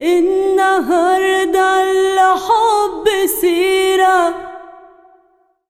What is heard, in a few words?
SINGER, Arabian Traditions